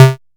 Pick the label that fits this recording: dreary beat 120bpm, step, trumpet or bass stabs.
bass stabs